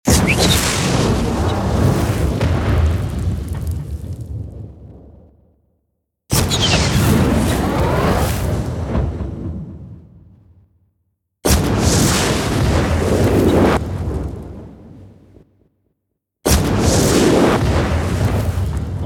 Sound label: fire spell pack